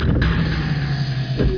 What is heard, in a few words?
EXIT OPEN; PC World Komputer 1996 February; virus